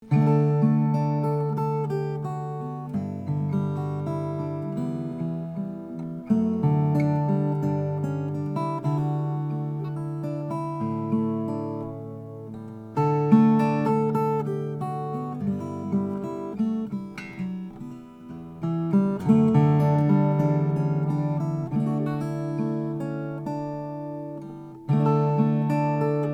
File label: kevin ryan abbey parlor diamante african